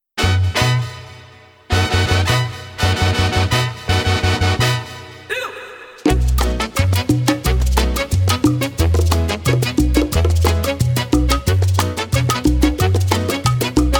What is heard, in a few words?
Rumba Mambo Cha Cha Cha